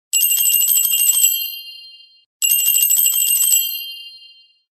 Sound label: Ring Ring